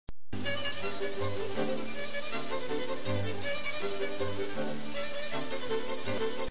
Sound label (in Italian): allegro molto